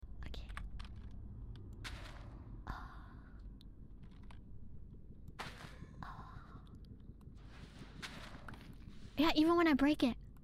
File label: soul sand asmr